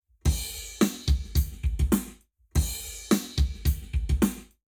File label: Lab Wet, Drums 0 to 360 deg